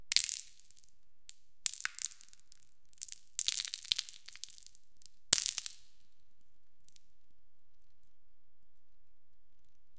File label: a light cascade of crispy, biscuit